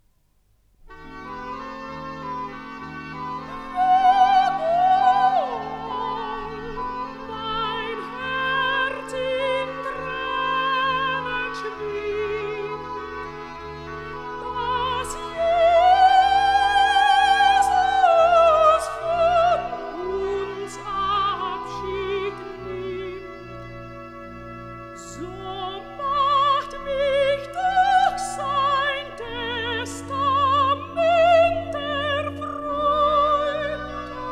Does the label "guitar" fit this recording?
No